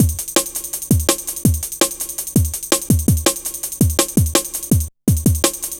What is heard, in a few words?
Step Off, drum loop, jungle, 165.5; Zero-G Jungle Warfare 1, 2 & 3